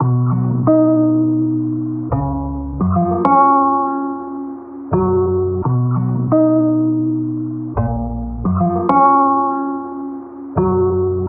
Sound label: guitar hondo; Loops; Phonky